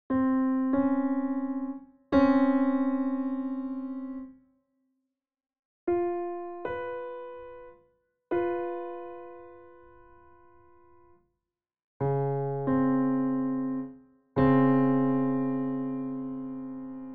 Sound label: dissonance